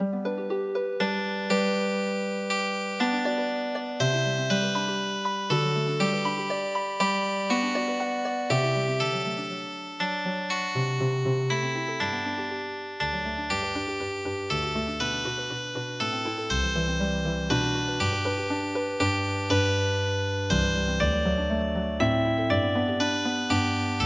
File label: Healing Harp